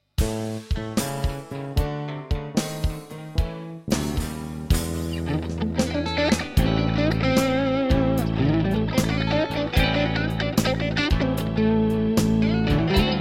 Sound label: Nashville T-15R Custom Midnight blues